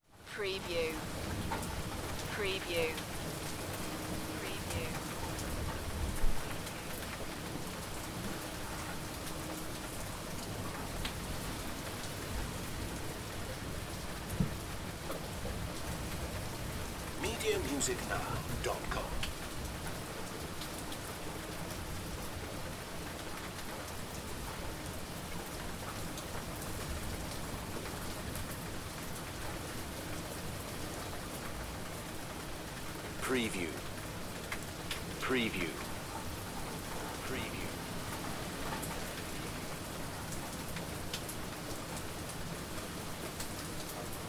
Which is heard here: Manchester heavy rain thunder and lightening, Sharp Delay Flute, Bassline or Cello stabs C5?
Manchester heavy rain thunder and lightening